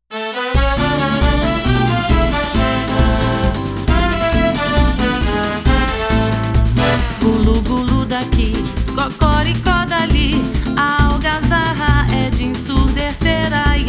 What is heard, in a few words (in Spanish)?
marcha; peru